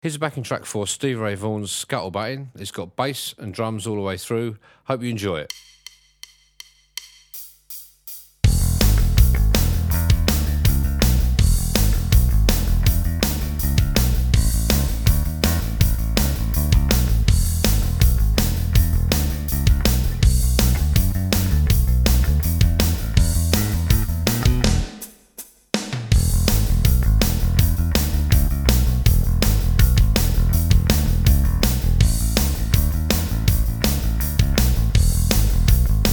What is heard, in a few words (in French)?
Backtrack playback guitare